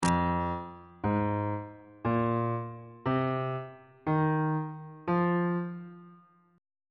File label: f minor pentatonic scale